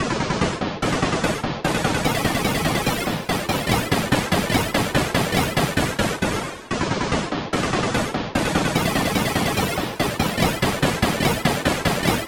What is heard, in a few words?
gun shot